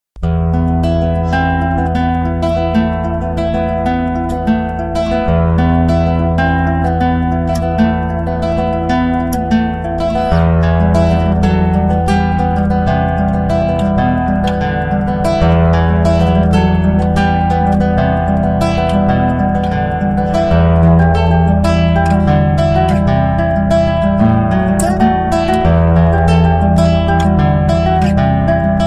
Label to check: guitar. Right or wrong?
Right